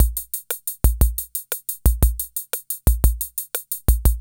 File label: BALLAD, KR55; INIS - Funky Xtreams